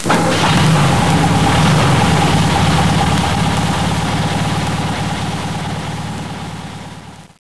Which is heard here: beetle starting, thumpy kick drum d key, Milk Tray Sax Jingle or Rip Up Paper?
beetle starting